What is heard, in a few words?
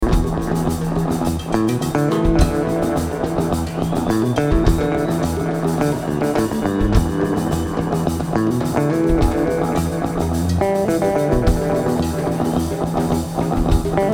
Drums and Bass